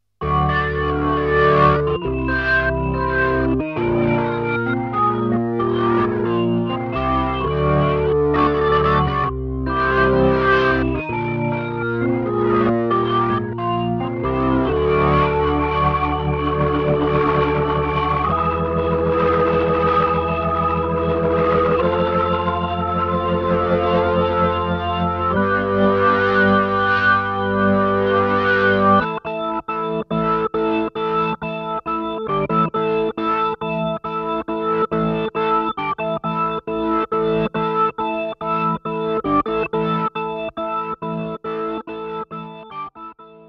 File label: Organ Drive Frumpy